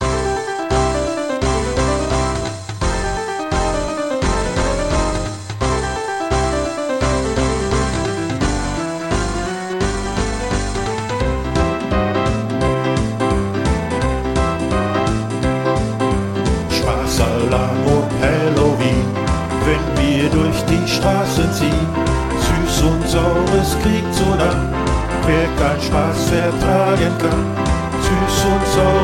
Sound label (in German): kinderlieder